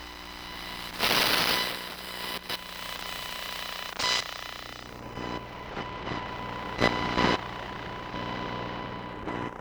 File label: Computer Monitor, electromagnetic sounds; Micro Pack; NazdyNate